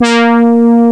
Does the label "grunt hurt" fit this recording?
No